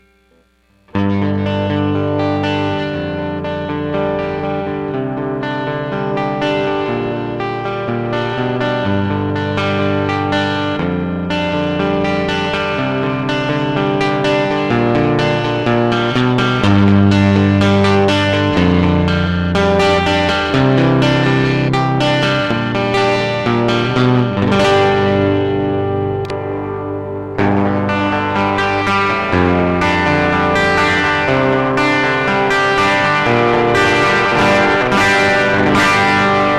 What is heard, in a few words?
noodling, Distortion